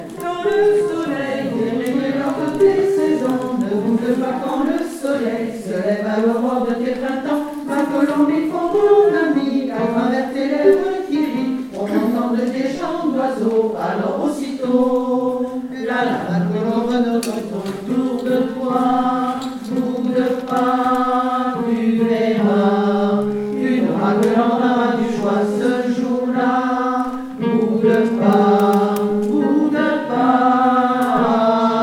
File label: Fin Altos